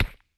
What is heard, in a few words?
First Person Face Punch